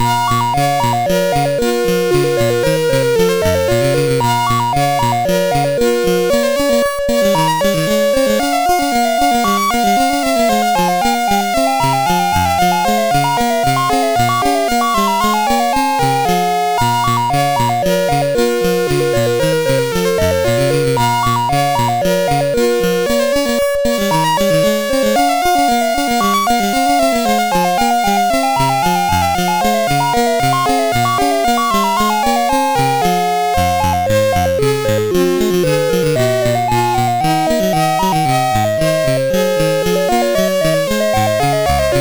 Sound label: Badinerie, CSG8580R5; Music Shop